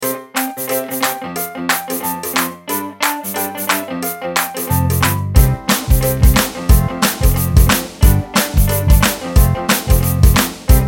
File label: Happy Intro Music